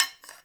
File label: Hunter Bow Reload Arrow Knock; Weapons; Enemy; Bows